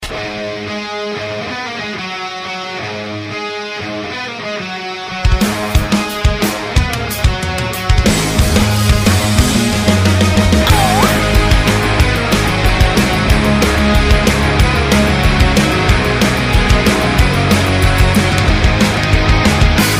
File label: rock and roll riff